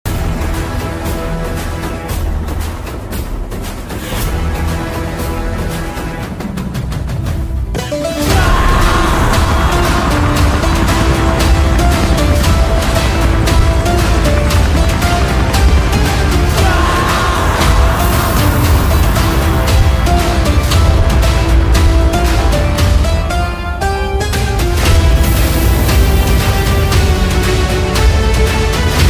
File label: mass bgm ringtone; KGF chapter; yash; hombalefilms; srinidishetty